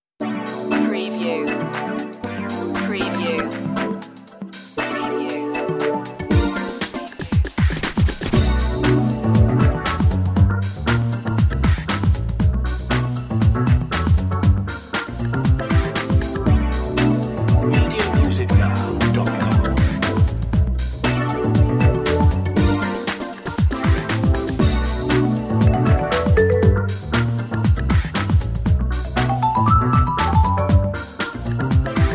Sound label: groove set